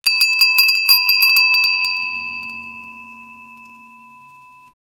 school bell sound effect

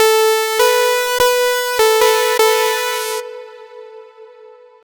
early 80s tragic heroism, badass

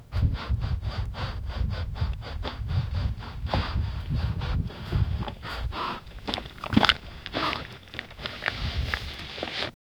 sound of a man climbing, mountain

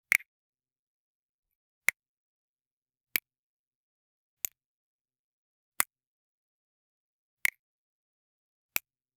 light clink of pearls, jewelry

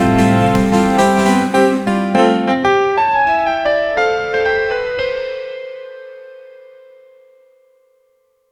radio jingle

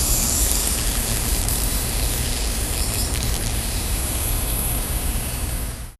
magical shimmering sound; flicker

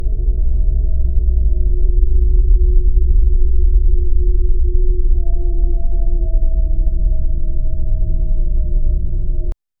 generate a seamless loopa, post apocalyptic

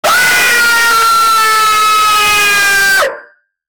animatronic scream

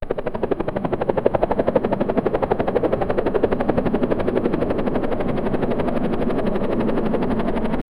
helicopter hovering; aerial

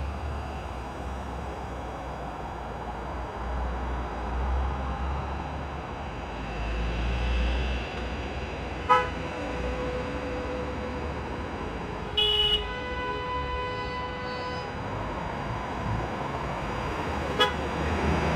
new york city streets car; ambient noise

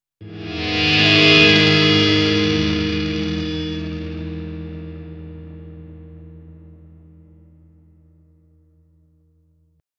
imagine a deep slightly, rock

